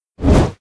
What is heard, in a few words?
Flapping